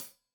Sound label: Hat Tight, Hi-Hat, Cymbals; Deeply Sampled Drums